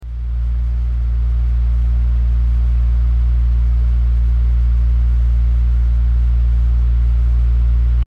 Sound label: Fan